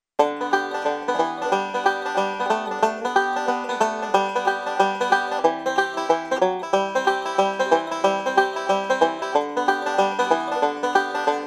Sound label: Do Lord Thumb In & Out Roll